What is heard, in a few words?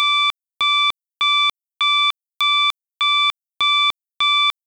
tone 500 interrupted